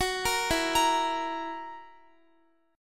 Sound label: E Suspended 2nd Flat 5th E down Guitar; Esus2b5; arpeggiated